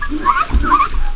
SQUEEGEE

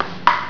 BALL; IMPACTS

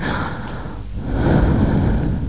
BREATHE; PEOPLE

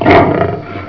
growler; ANIMALS

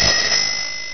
bell bike; ALARMS

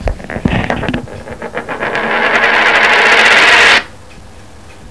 SPIN COIN

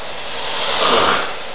HISS

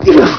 exert; PEOPLE

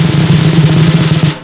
DRUMROLL

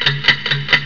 TICK FAST; ALARMS

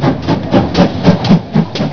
RAIL STM; TRANSPOR

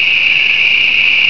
CHIRRUP; ANIMALS

